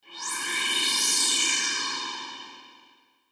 Sci Fi Warp Airy Tubular, Sci Fi Transform Glass Aliasing Swirl